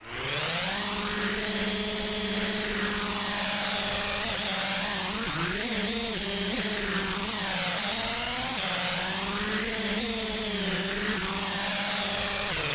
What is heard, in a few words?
commando defeat chopper; smite; zhongkui